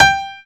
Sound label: PIANO; SoundFactory